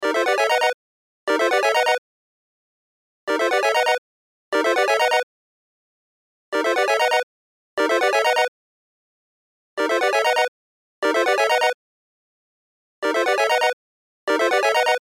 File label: Cell Ringtone; Loop; Cell Phones; Blastwave